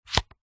household phone charger wireless slide open; zapsplat

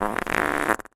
human fart

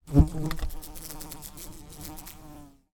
animals insect fly buzz on window; sound effects one

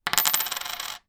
foley money coin x1 down on table spin; zapsplat

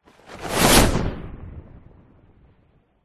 superhero fly by fast whoosh cape; fantasy; zapsplat

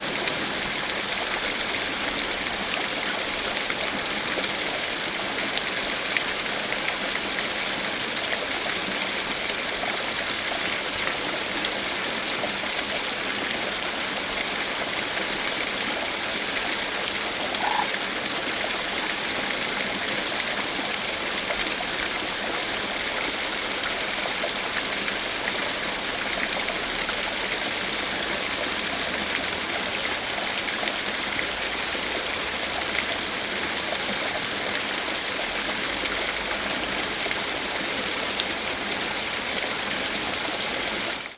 water waterfall small birds

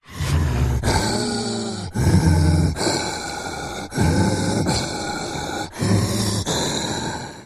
horror monster creature breathing close up

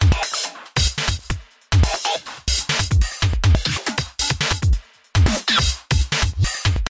musical drum loop electronic 140bpm; Audio Mangler

